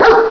short bark

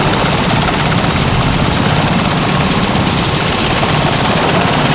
chop ride; baseq2

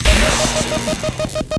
tnk pain, tank

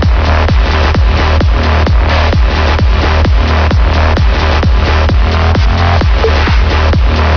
open your eyes trance; spawngirlsounds

